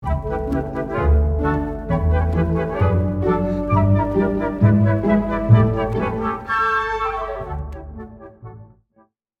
Merlitons Bsn, a legato counterline in the bassoon.; Rule of Three; The Nutcracker